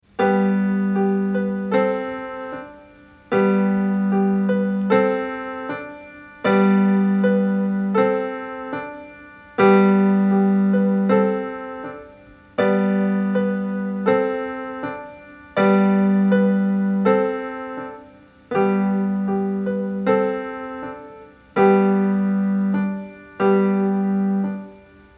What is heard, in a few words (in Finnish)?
piano